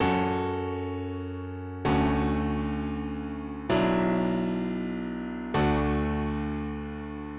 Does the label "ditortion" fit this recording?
Yes